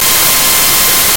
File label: No Signal